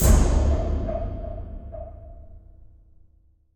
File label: Player Fast Death